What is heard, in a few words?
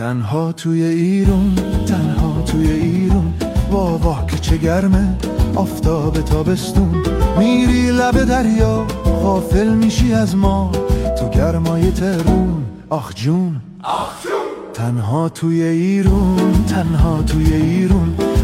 persian Jazz